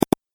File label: Double Click Sound